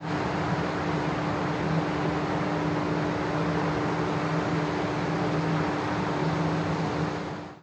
hydro plant; magedu; simutrans; Pak128.Britain-Ex

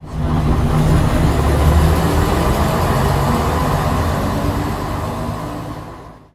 daimler cvg6; Simutrans; Pak128.Britain-Ex

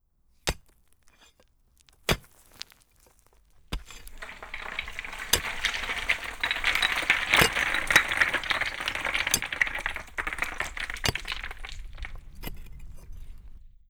quarry mix; Simutrans; Pak128.Britain-Ex

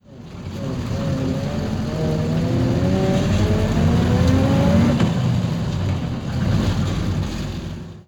leyland titan td5, Bus; Simutrans; Pak128.Britain-Ex

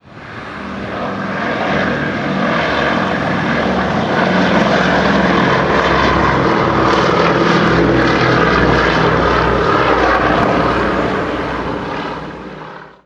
boeing b-17; laxspotter97; simutrans; Pak128.Britain-Ex

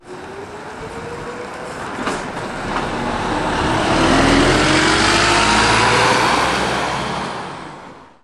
volvo b5h; simutrans; Pak128.Britain-Ex

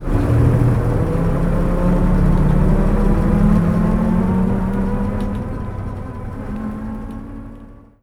leyland titan td1; Simutrans; Pak128.Britain-Ex

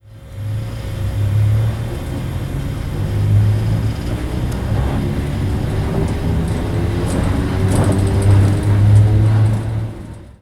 epb; x24tohayes; simutrans; Pak128.Britain-Ex

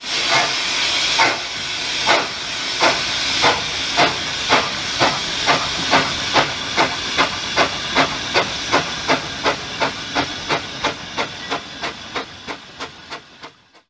tank; wllr; simutrans; Pak128.Britain-Ex

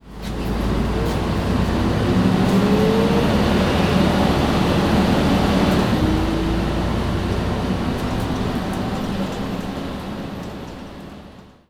volvo bm10; Simutrans; Pak128.Britain-Ex

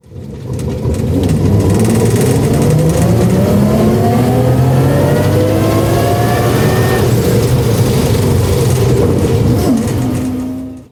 dennis e; richard walker; simutrans; Pak128.Britain-Ex

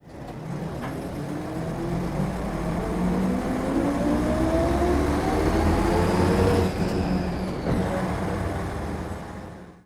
volvo alisa b55; Simutrans; Pak128.Britain-Ex

